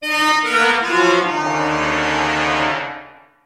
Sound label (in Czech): FANFARA BUUU; pat & mat game